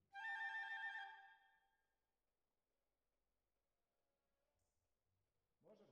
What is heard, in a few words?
Violins Over